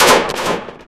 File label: close echoless sound of a, tanks